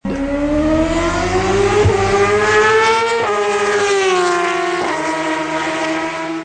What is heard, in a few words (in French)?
Moteur Yamaha